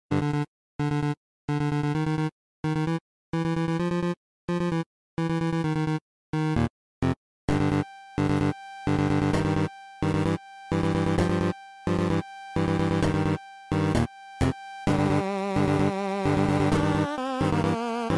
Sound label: 8bit